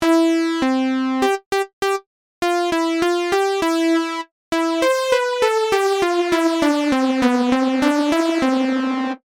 FX Flanger

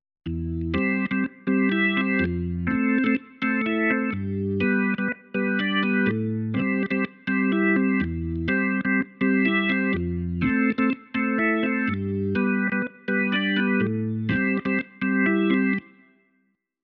organ dry

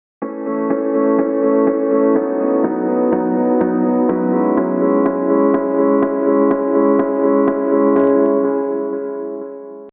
Piano

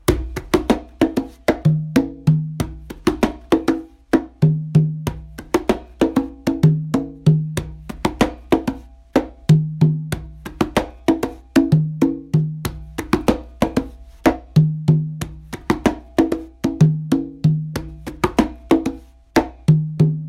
conga

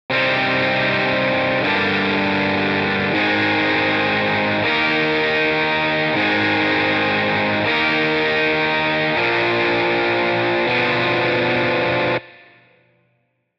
Guitar

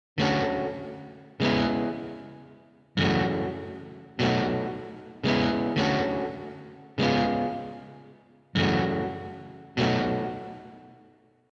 Guitar

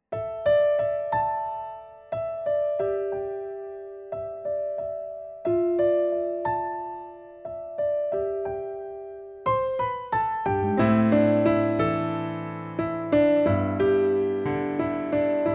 steinway

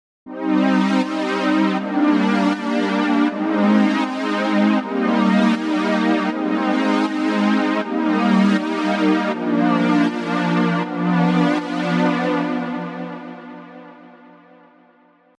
Synth Pad

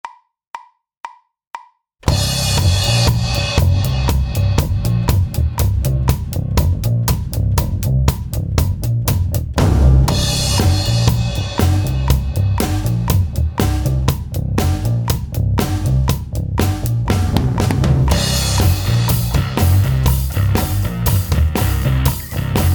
with drum

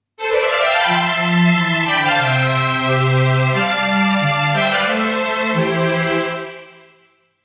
strings

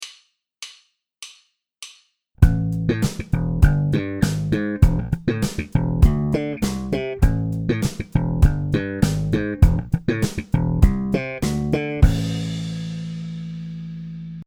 mute thumb pull

